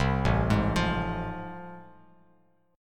A Minor Major 7th Sharp 5th A, Bass Standard; arpeggiated; AmM7#5; 8,0,6,10; JGuitar

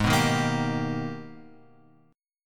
Gsharp Minor Major 9th; G#mM9; {4 2 1 3 X 3} strummed

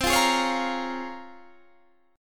Bb Minor 7th C; Bbm7/C strummed

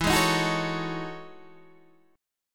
E Major 13th down Guitar DADGAD

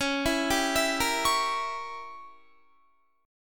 Db Diminished 7th Db down Guitar; arpeggiated

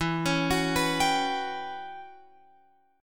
E Minor 6th Add 9th guitar chord, Open F; Em6add9 arpeggiated; JGuitar

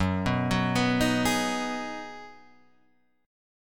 B 6th F sharp, guitar chord

